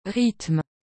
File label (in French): rythme